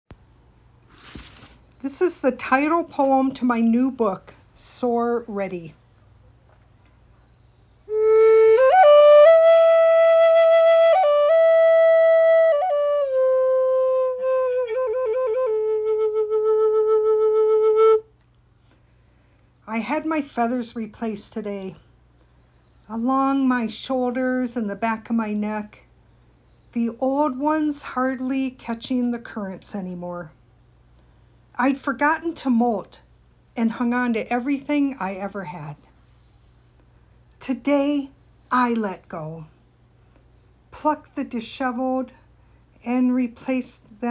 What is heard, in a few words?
with flute